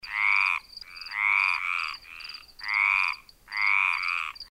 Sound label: Old Frog